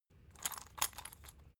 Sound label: salt mill metallic slow